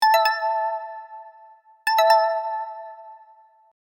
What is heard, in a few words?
Bluetooth pairing tone headphones connection; Headphones and Speakers